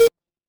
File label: retro game coin